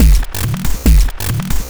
Synth Beat, Trip-Hop; Rondo Brothers Trip-Hop Loops & Samples; Don t Wake Up

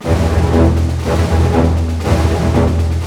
SNAR, Snare Army; East West - Scoring Tools

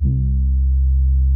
SY BRASS 1A C1, SOFT BRASS; Music Store - Claudius Diemeter Schwerlos

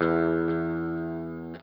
NOODLE LONG, Guitar Bass; Quantum Leap - Guitar & Bass

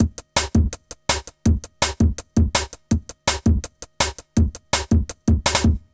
165 BK, Explosive Jungle; USB Soundscan vol.05; AKAI; 90s sample CD

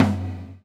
GATE TOM, Drums Acoustic; USB Soundscan vol.10; AKAI; 90s sample CD

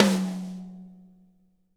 tom, drum

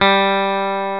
Elec. Guitar G2; Proteus 2000; Multisamples; Digital Sound Factory Proteus 2000

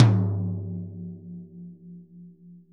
ROTOTOMS; Sonic Reality

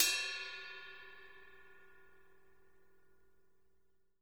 RIDEBELL; KITS-LARGE

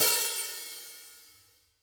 HTS OP R, Drum Kits

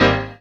chord; EastWest; Dance Mega Hip Hop Electro; CHORDS

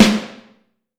Snare Drum, 5X14; Q Up Arts; Johnny.C's Vintage Snare Drum

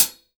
Kit HH, Drums Acoustic; USB Soundscan vol.10; AKAI; 90s sample CD

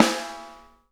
SNARES, EDG, Drum Kits

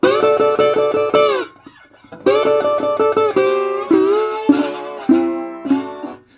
120, Blues Guitar; ILIO; Fretworks; SIMMS

